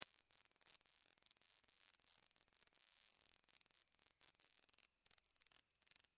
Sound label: white noise